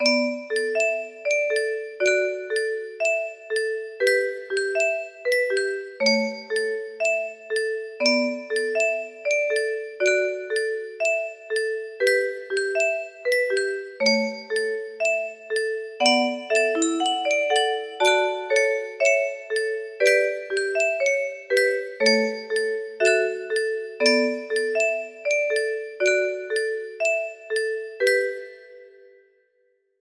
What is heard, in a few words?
melody, music box